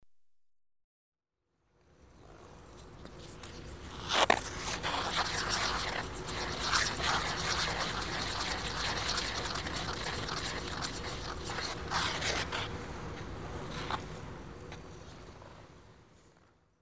Ice Skating - Spin, on an indoor rink (for use at low level); The BBC Sound Effects Library; Sport and Leisure